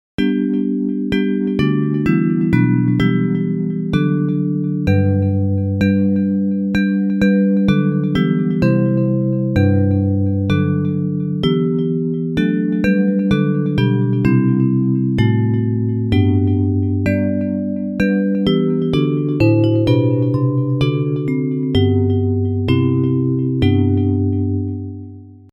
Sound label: Bells